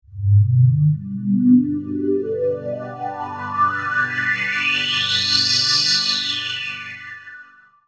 Digi Pads; Jungle Frenzy 1

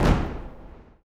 STOMPER; Spectrasonics Vocal Planet

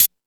Fusion Stix, E-MU Pro-Cussion

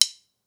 Stick; The Roots Drumloops

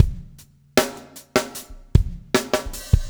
SLICK

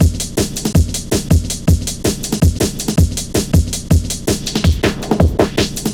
Drum Stepz, Drum Loops 161.5; Zero-G Jungle Warfare 1, 2 & 3

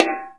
Bongos, Latin; Roland L-CD701

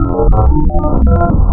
KORG VS, wavetables, Classic Synths

